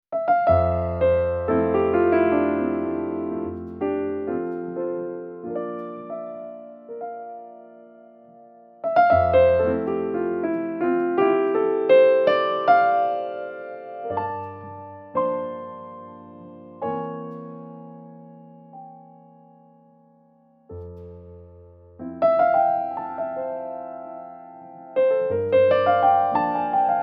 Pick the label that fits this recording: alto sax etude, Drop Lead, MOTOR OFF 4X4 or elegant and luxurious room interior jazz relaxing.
elegant and luxurious room interior jazz relaxing